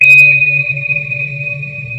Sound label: sonar Ping Water Medium Directional; Barotrauma; Real Sonar